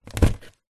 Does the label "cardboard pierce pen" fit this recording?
Yes